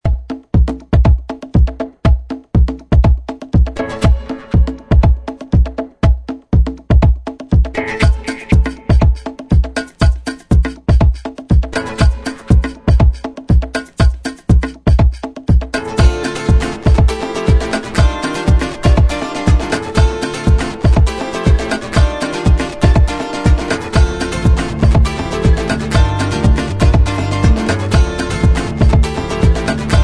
SAMBA; Suite Collection Vol.5

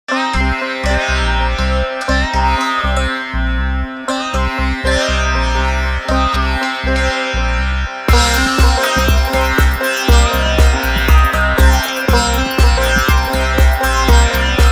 ETHNO POP; Etnik Coll. Vol.3

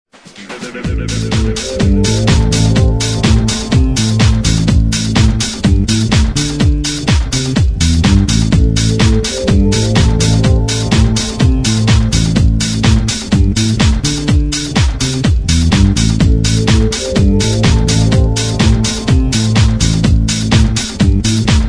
House

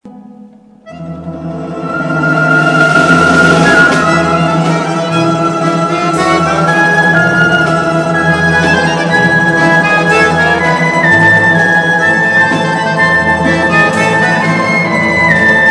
Jewish folklorist music; Sem' sorok

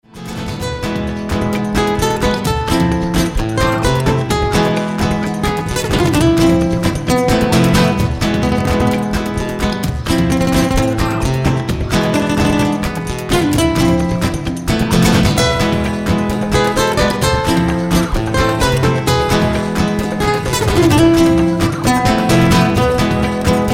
Spanish

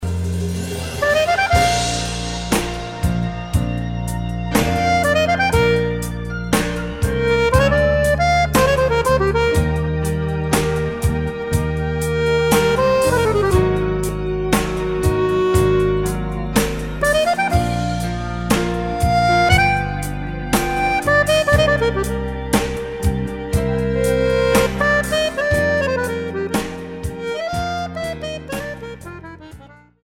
ACCORDION BLUES

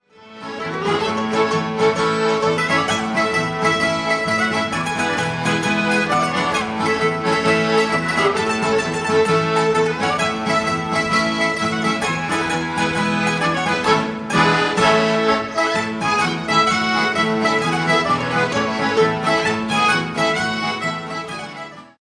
TARANTELLA; Canti Popolari Italiani

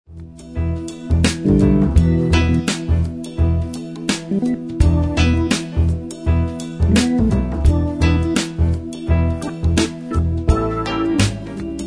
E BLUES; Suite Coll. Vol.9

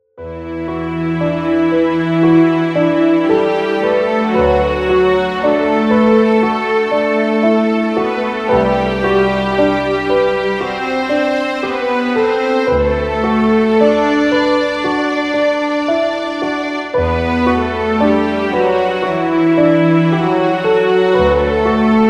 Sad song

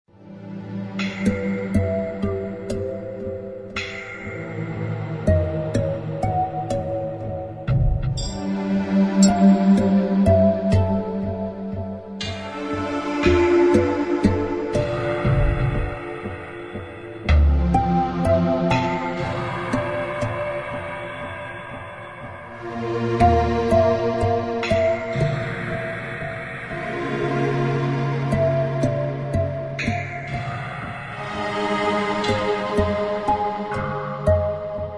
ATMOSPHERE, New Age; New Age Collection Vol.3

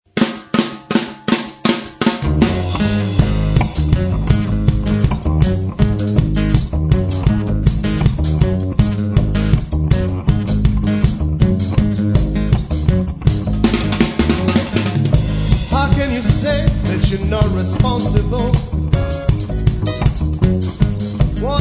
(acoustic version)

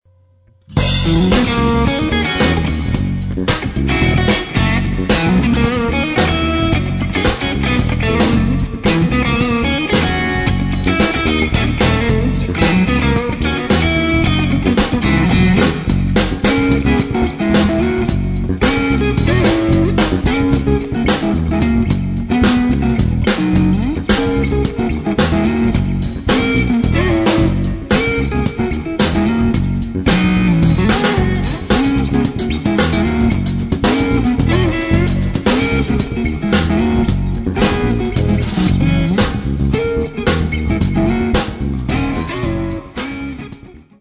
7th Funky; Suite Collection Vol.4

